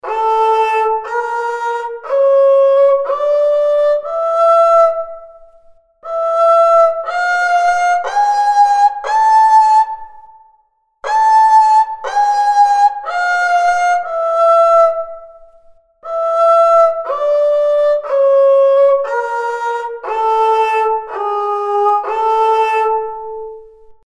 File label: kemençe zirgüle